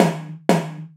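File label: bass drum deep, drum machines; 300 Drum Machines; E-MU Orbit-3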